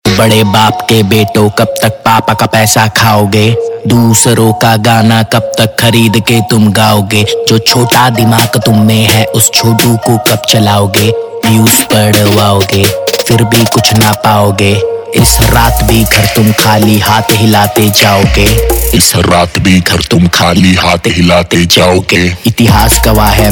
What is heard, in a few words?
Rap Ringtone, Punjabi